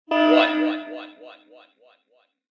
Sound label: self destruct, ships combat; CosmicRage